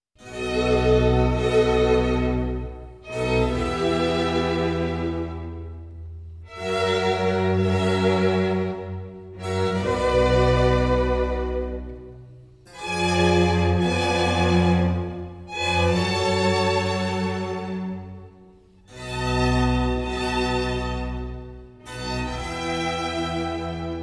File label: Concert For Strings & Harpsichord In G Major - Adagio; Vivaldi; Alla Rustica